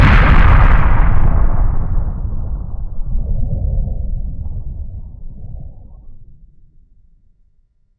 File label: Huge explosion in distance; Hovl Studio; SFX Battle sounds; WhiteMan Unity2D